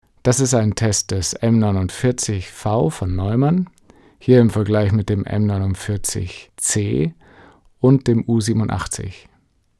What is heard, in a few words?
cardioid Vocals 20cm no popfilter; Neumann U87